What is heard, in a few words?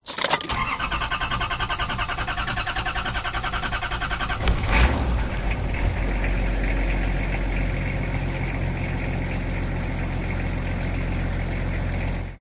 vehicles car engine start struggle then short idle int nissan patrol 1998